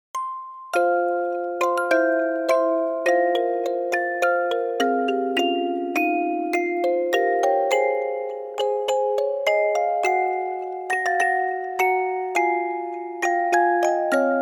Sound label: Good Night; Mana Bless You; Good Night, Sons Of Mana